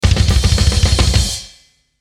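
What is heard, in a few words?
Musical Element, Drum Snare March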